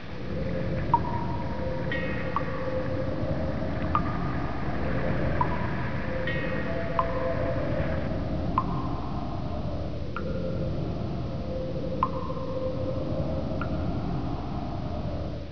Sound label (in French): gouttes; de suburbs